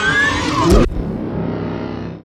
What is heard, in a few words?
Absolute block; Fly For Insanity